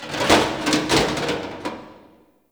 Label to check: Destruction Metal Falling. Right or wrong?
Right